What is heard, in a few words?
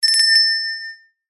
bell small ring close clean shop door bell